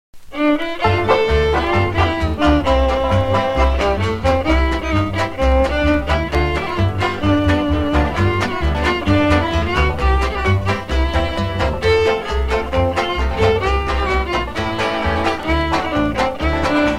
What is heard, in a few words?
(instrumental)